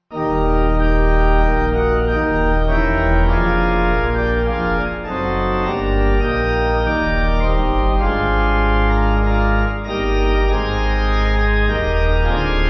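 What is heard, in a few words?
Pipe Organ; Lift Up Your Voices; Waltham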